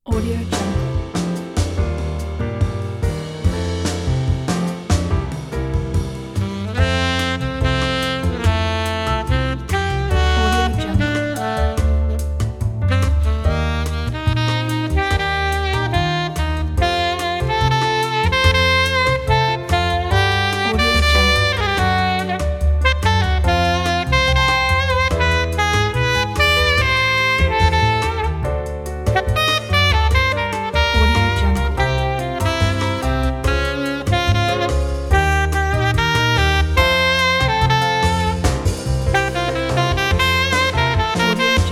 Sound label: warm bossa nova sax